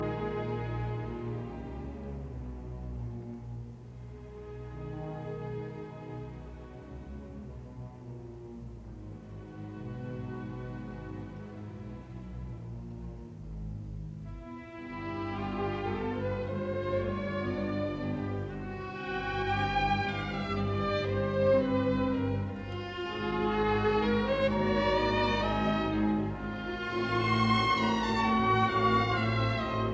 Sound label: Symphony in D minor, Adagio Bewegt quasi andante; Symphony No 3; WAB version ed T Raettig